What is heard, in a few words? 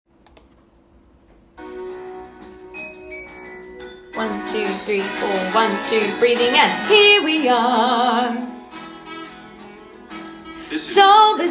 Melody + Soprano; Fame The Musical - Holiday Pack